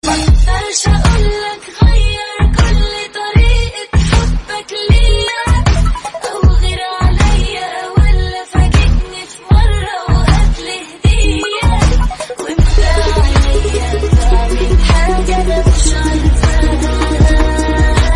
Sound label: arabic ringtone